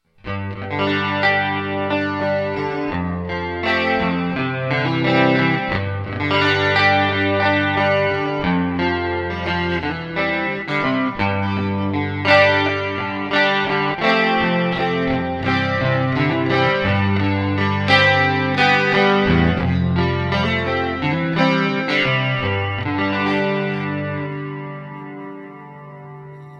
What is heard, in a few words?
Chorus